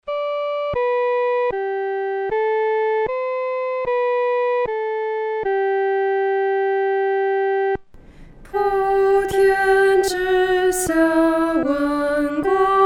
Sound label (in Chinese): 独唱 第一声